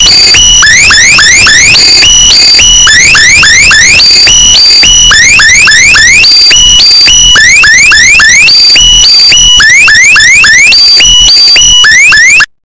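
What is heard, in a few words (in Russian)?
громкий звук глитча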